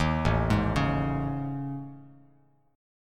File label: A Major 7th Flat 5th, down Bass Standard; AM7b5 arpeggiated; JGuitar